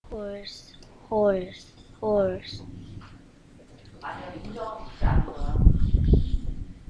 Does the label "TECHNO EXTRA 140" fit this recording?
No